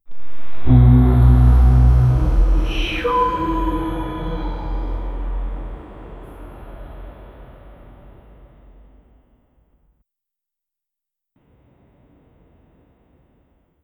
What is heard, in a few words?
the sound of good karma, gameplay